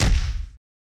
fighting punch; soundscrate

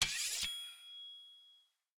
Draw Sword; soundscrate

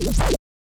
stylized glitch sound; soundscrate

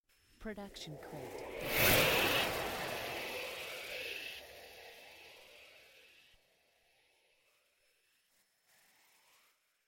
High Woosh; soundscrate

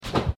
Falling Thud

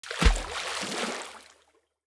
heavy splash; soundscrate

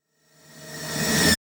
Fast Horror Riser; SoundsCrate

